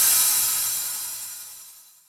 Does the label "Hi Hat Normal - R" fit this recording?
Yes